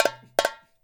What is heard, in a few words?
FILL R, Percussion; InVision Interactive; Percussion Slam